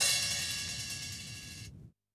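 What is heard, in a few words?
Vinyl Open Hat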